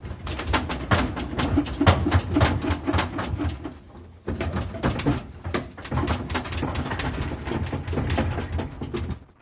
Metal Cart Being; carts

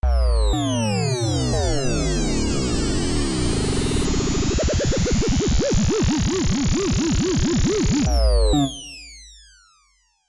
ELCTRO FALLING SYNTH, drones & tones

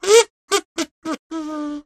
Kazoo Various Vocal

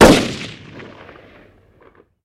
Gun AR-15 Single Shot Fire Quick

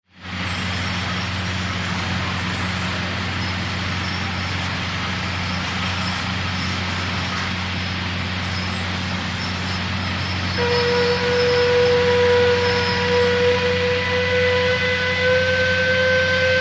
Blast Site Warn Whist; construction large

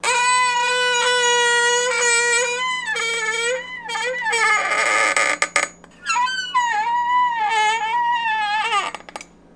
Chair Squeaks Moans

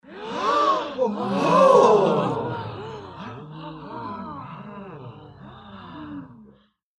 Gasp Oooh Wonder Sml

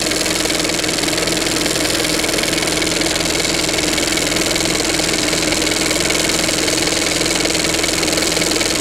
35mm Projector Runs; projectors; movie & projectors

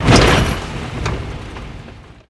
Car Suspension Bump R; hits & rattles

